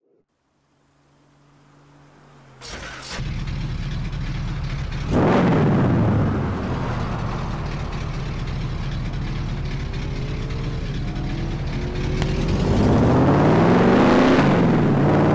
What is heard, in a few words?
dodge challenger rt